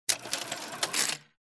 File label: Cash register opening